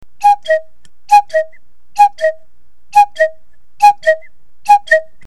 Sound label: woo hoo